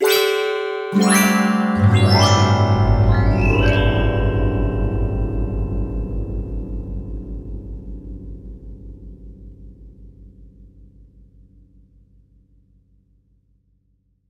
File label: ZING; eShop